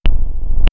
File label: click